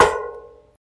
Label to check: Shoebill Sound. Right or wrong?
Wrong